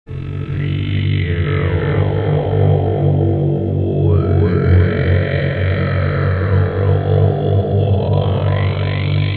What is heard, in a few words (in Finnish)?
kurkkulaulu